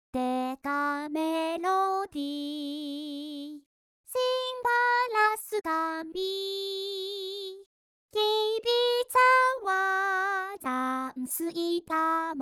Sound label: vocaloid